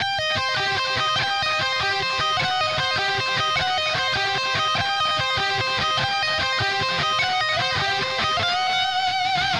Evil Lead E

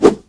Target Down; MilkChoco

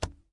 Cruiser Dashboard Button; Lethal Company

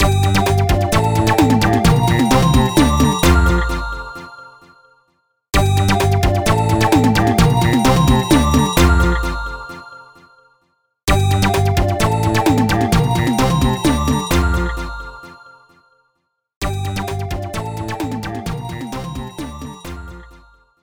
Microgame Success; Gremlins Gizmo; wii

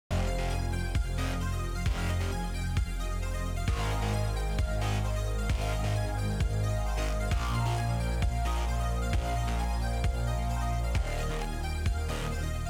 Intro Music; Matterfall

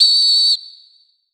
Whistle Long

